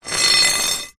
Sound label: foley metal rod scrape on ground